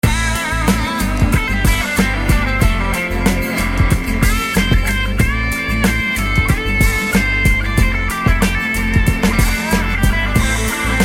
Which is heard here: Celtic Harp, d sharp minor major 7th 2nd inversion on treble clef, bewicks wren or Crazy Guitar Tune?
Crazy Guitar Tune